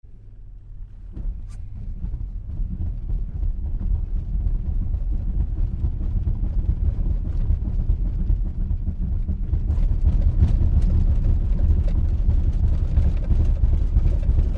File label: Car Tire Flat; Start, Slow To Fast Roll With Motor, Interior Perspective